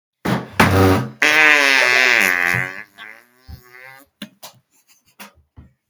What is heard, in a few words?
big fart